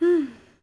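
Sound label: Horse Archer Fidget Vox; Civ4 warlords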